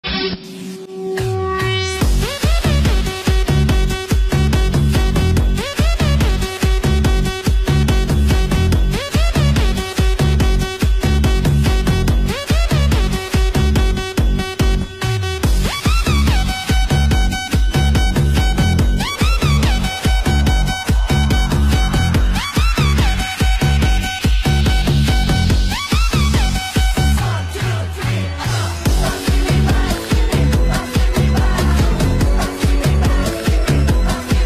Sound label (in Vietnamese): Nhạc Dj Cực Mạnh Bass Căng